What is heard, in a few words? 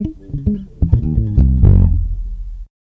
Bass FX F, Bass Guitar Licks FX